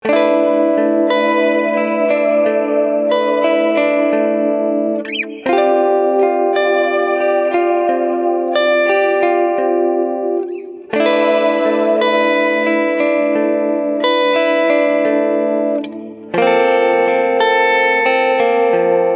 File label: chorale, bigsky; reverb; Vocal Pad